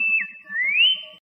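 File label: r2d2 whistles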